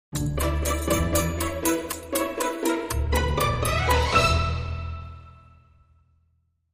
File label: Tick Tock Tick Sting